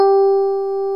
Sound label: DIGITAL SYNTH; OMI - Universe of Sounds